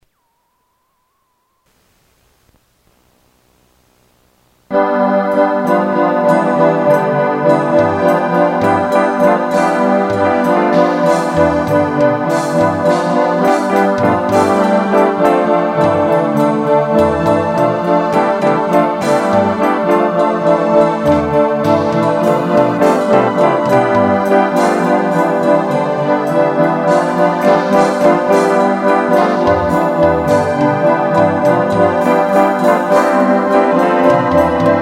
Pad

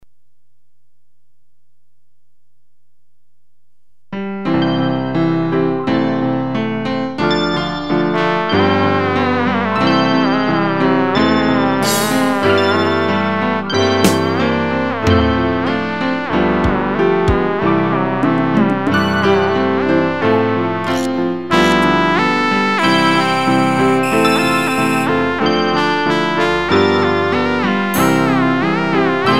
DGX with Babbling Trombone

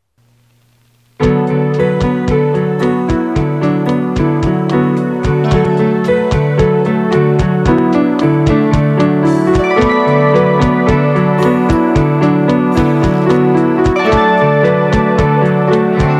Pad

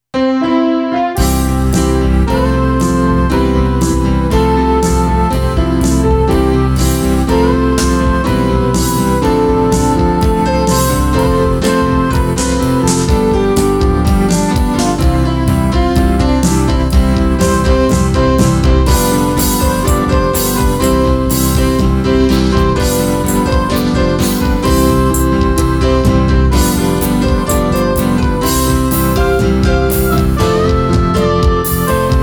inst

